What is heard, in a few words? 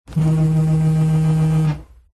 household mobile phone vibrate on surface slight rattle